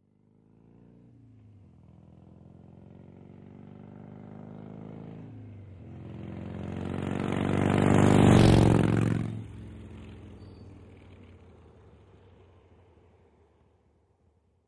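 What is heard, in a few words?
Motorcycle Harley By Medium; harley-davidson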